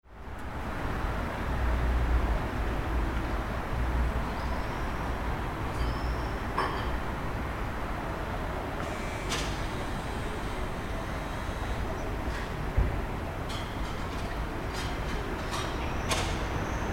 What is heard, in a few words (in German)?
Stadtatmo; steinberg spectral layers pro 8